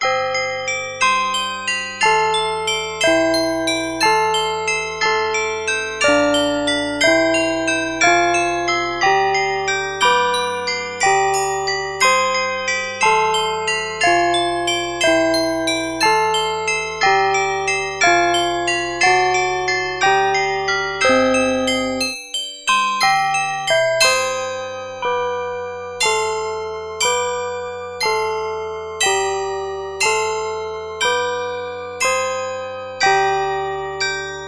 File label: glockenspiel